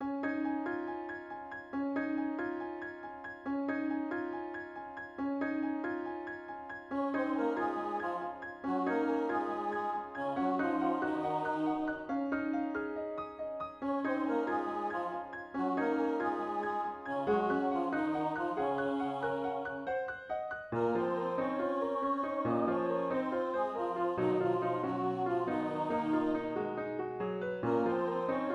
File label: Bass